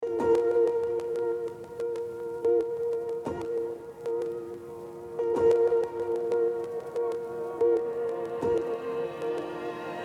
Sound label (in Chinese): 钢琴; Komplete